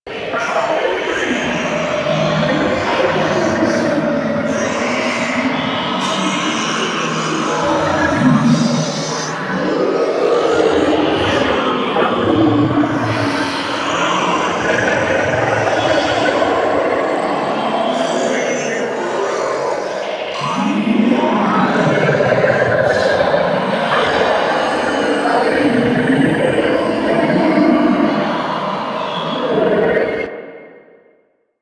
Armour Integrity Failing Loop; Ambience; Warframe; Infested; Golem Trial

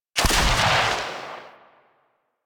Heavy Grenade Launcher Shot; Warframe; Archwing

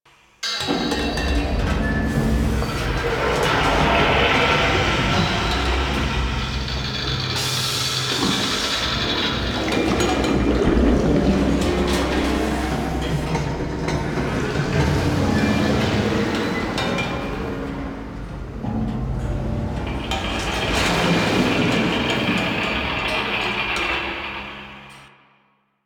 Failure Pipe Tunnel Loop; Warframe; Ambience; Entrati

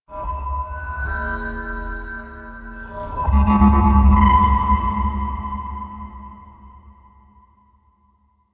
Tech Texture Large; Warframe; Cephalon Weave; DE

